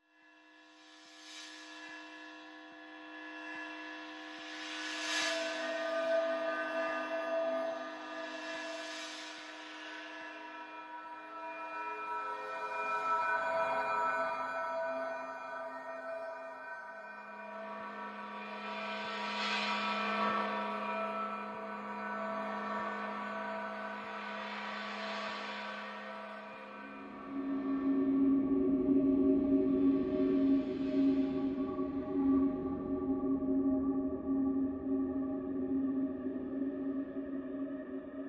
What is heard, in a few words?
Drums, Pads Only